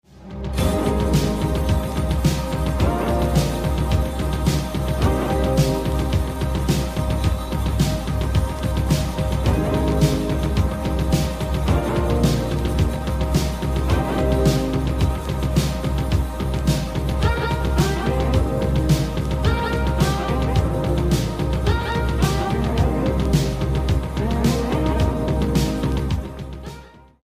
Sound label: Instrumental